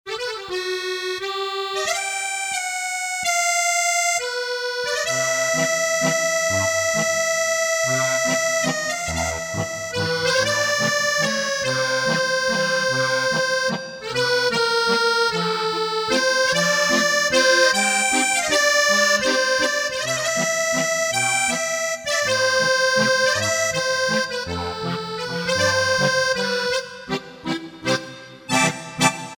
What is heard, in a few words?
Casio CTK-601, Tones, Accordion